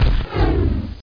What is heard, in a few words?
METEOR; bugs